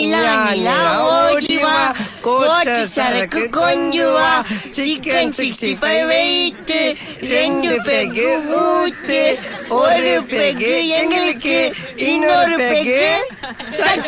Sms Rhyms

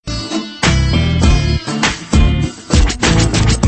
Classical Hip-hop

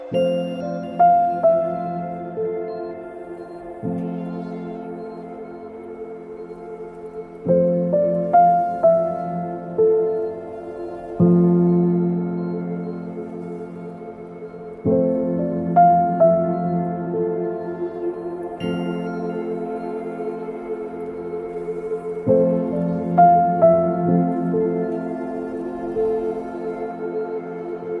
gentle morning alarm

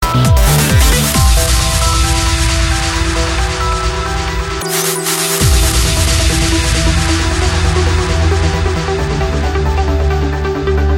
Trance Mix

